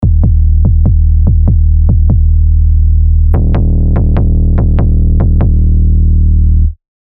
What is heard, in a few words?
Room 808; sylenth1 hard techno; revealed sylenth1 hard techno vol 1